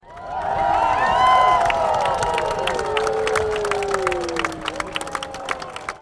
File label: AUDIENCE Claps and Cheers stereo; Infernal Radiation